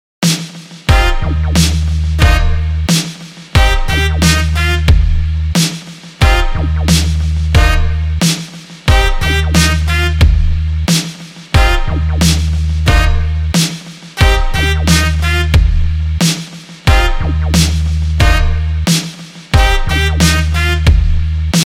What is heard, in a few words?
reggae